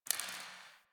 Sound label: Derelict Interior Water Drip; Warframe; Orokin Derelict